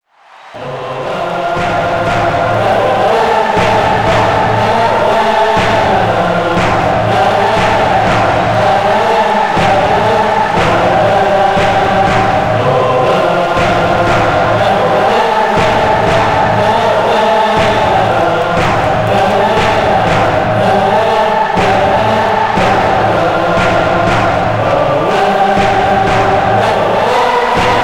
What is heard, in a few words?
Chants; Aida